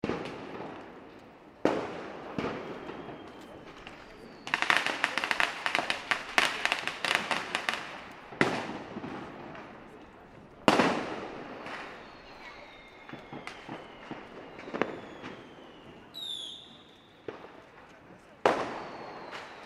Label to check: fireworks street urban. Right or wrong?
Right